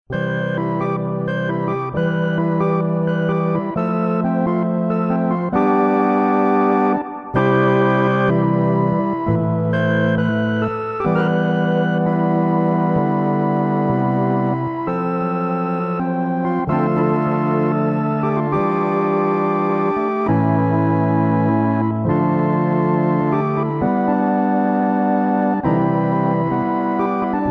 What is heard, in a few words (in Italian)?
solo musica